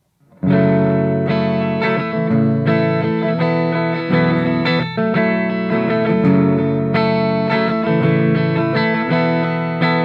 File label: dirty edge, Edge of breakup, all three pickups; tc electronic